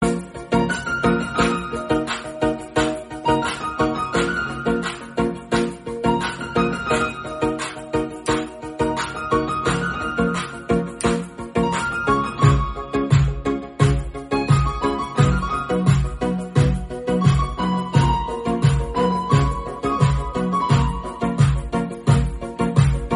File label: bgm background music